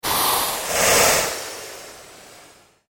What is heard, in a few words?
PULSE LONG SWEEP